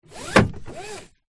car seatbelt pull jam release

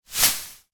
sport pom pom shake single; zapsplat

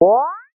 game sound classic jump

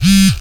household phone cell mobile vibrate close up

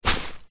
footstep single long grass; foley; zapsplat

